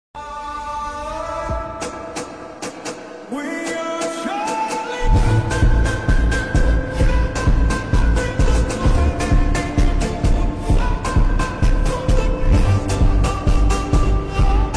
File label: Funk slowed reverb, phonk